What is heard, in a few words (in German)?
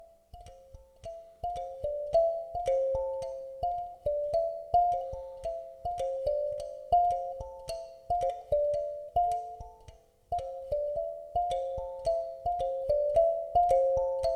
Fingerorgel Kalimba